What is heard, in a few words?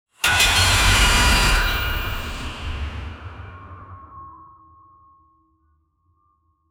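hard fast metallic footsteps; robotic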